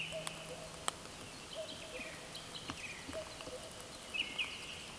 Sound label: Cuculus canorus